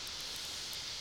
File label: Background Noise